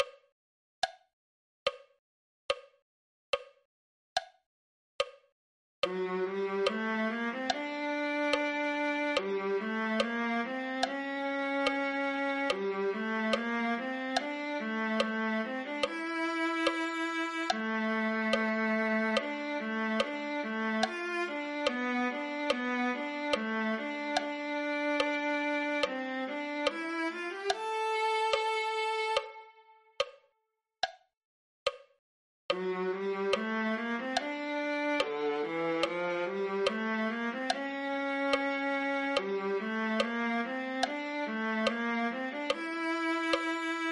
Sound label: viola